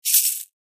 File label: shaker toy maracas real